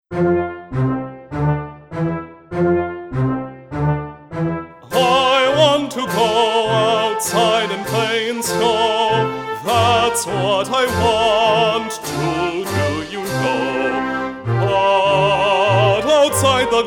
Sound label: V I Want to Go Outside opera